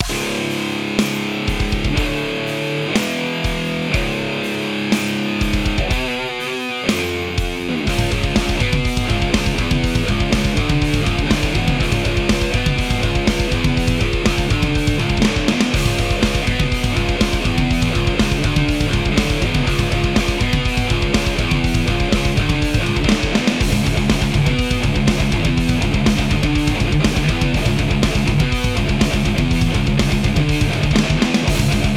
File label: guitar delay; doubletrack gtrs